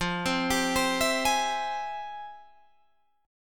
F Minor Major 9th, Guitar Open D, chord

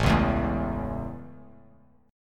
A Major, Bass Standard; strummed

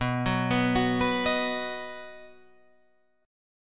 B Major, B, down, Guitar Drop D

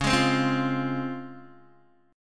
C sharp Major 7th C sharp Guitar DADGAD

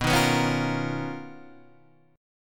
B Major Sharp 11th, Guitar, Standard; BM#11; strummed; JGuitar

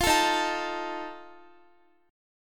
Eb Suspended 2nd Flat 5th, Guitar, chord; Ebsus2b5 strummed; JGuitar

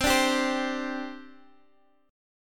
C Suspended 2nd, C, down, Guitar, Open D

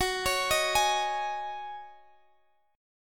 G sharp 7th Suspended 4th F sharp

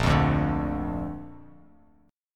Bb Major Bb down Bass Standard, chord; strummed; JGuitar

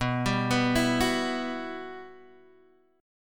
G Major 13th B

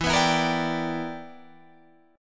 E Minor 7th Sharp 11th E; strummed

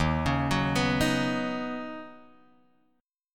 Eb Minor 7th Sharp 5th, down, Guitar, Drop D

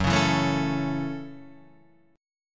A 6th, F sharp, chord

